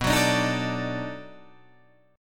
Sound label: B 7th Sharp 9th, down, Guitar, Open D